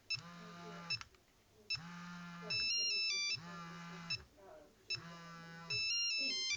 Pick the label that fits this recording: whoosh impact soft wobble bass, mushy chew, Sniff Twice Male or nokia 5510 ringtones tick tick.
nokia 5510 ringtones tick tick